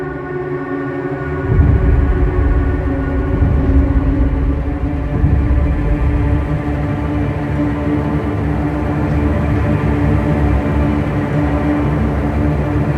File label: STEMS INSTRUMENTS LOOP; HauntedBloodlines